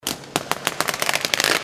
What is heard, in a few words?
Tape sounds